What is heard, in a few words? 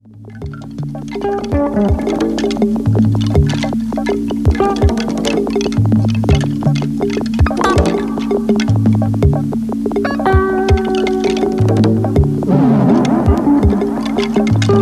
piano inferiore